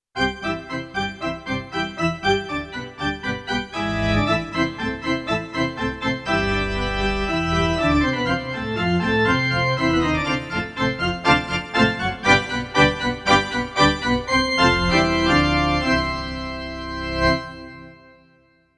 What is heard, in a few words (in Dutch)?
Kerkorgel, roland v accordion church organs; Roland V-Accordion soundpack Best of Church Organs; Kerkorgel 1